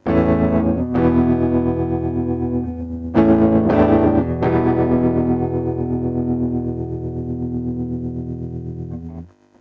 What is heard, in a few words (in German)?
E Gitarre mit Ampeffekt Tube30; iZotope Spire Studio